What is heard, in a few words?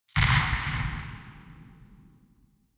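artillery start fire oneshot, tanks; War Thunder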